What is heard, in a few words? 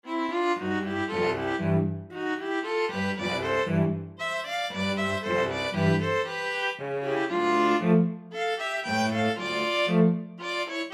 string trio